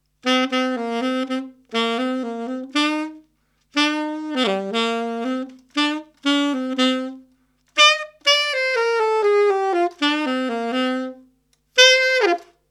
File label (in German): Saxofon solo 1m Abstand